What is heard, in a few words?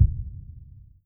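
background combat; soundscapes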